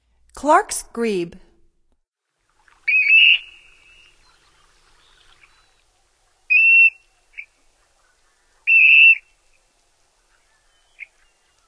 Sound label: Clarks Grebe; North America; Stokes